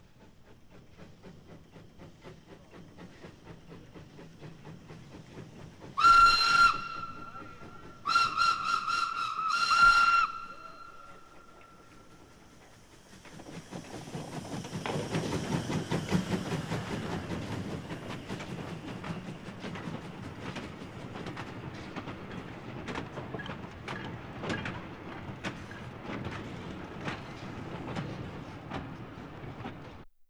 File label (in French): son locomotive; galland